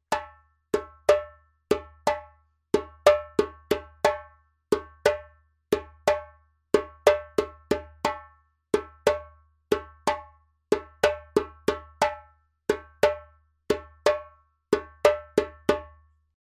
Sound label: djembe; djembebog oevelse